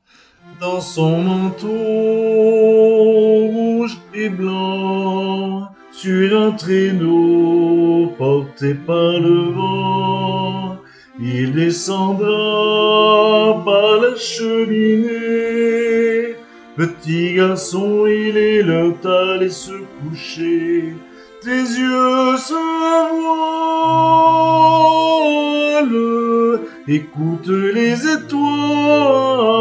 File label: Petit Garcon Soprano; Petit garon; Soprani